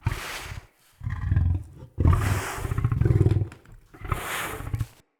Wolf Idle Trapped Groan; Animals; Vocals

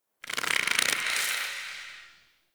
Tendon Stretch Medium Echo; Ambience; Misc Outdoor; Warframe; Infested Eris

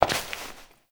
Step Rock; Warframe; Koumei

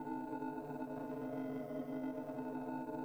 Queens Lair Amb Orbiter Part Four; Warframe; The War Within; Cinematics

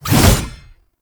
Lunge Impact Pre; Warframe; Oraxia

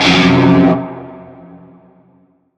Shawzin Hirajoshi Chord; Warframe; Narmer Shawzin

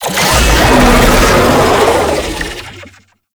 Infested Vocal Idle; Warframe

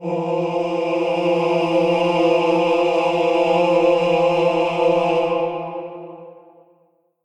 Requiem Mod Choir B; Warframe; Kuva Lich

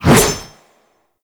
Melee Whoosh; Warframe; Wraith

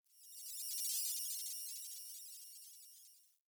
Computer Room Ambient Beeping

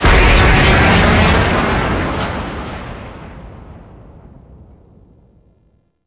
Stanchion Ship Missile Launch; Warframe; RailJack; Proc Ships; Corpus

